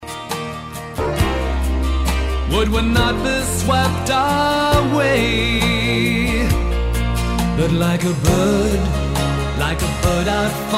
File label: Piano